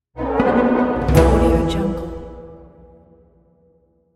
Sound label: cartoon animation closer accent cue